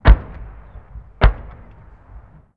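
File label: explosion medium; ModernWarfare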